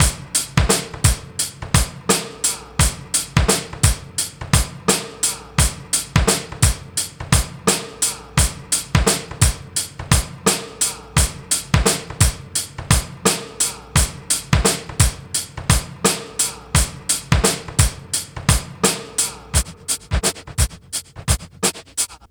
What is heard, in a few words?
08 TRIBE VIBE 86 bpm, Loops Breaks; POTBreaks